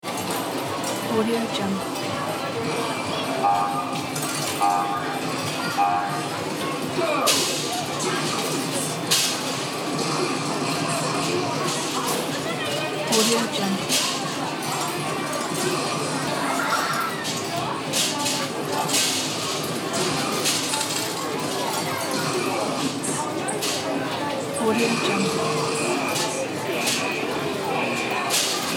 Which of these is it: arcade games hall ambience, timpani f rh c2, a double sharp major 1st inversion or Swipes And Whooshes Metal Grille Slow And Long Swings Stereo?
arcade games hall ambience